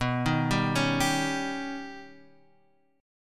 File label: D sharp 7th B; D#7/B Chord {X 2 1 0 2 3} arpeggiated